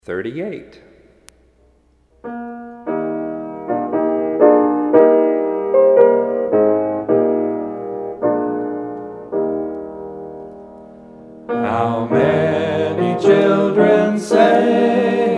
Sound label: Hymn